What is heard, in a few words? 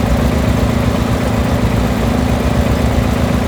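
Settlement background machine loop; Ambience; Warframe; Grineer Settlement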